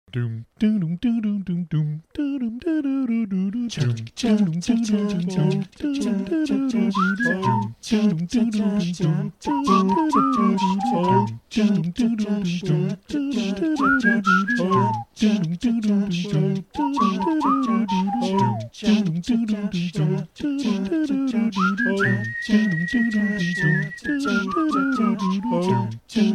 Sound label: birds